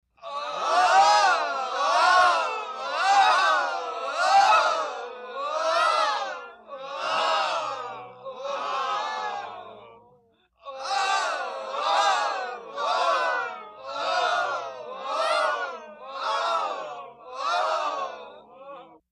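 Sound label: Group Wailing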